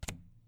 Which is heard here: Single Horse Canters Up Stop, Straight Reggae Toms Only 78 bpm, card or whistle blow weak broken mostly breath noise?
card